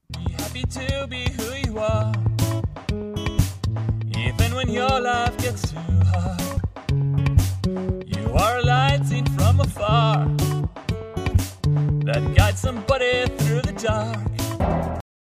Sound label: sing